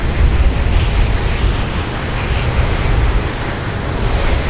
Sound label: tornado mini; smite; kukulkan